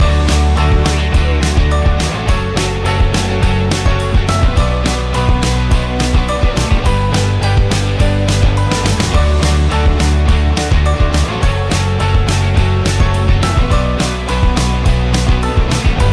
positive indie loop

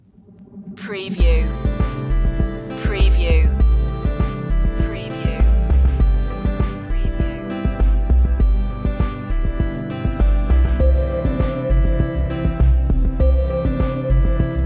underwater pulse

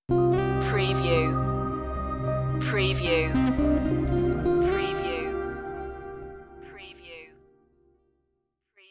landscape colors stinger

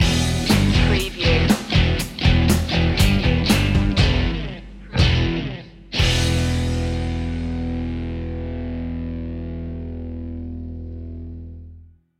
bikers bar stinger

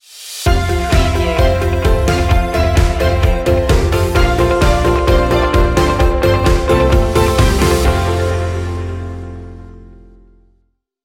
corporate awakening stinger

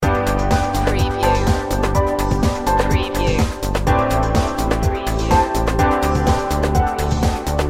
uploading love loop

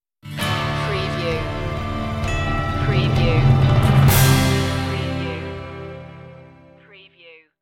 stinger

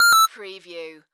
Chime Modulated

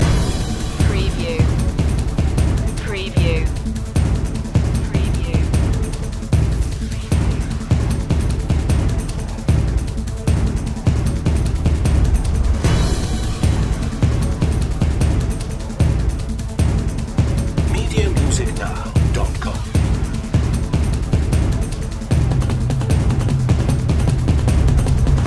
quake loop

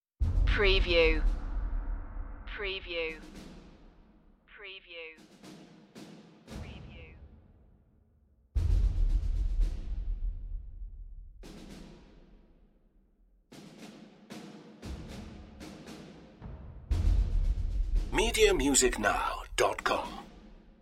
in the darkest hour perc and risers only

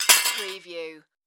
Sword Set Down Heavy

